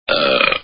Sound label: barney belch; simpsons